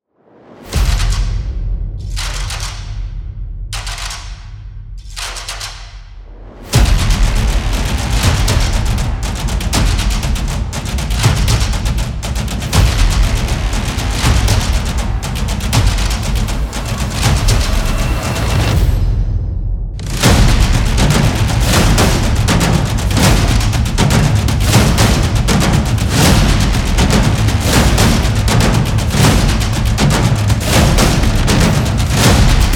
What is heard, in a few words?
Action Drums; intense drum beats